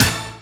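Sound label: Boss DR-202 percussion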